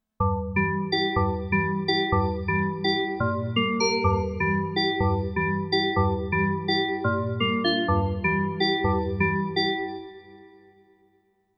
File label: Metal Drop; KORG Flare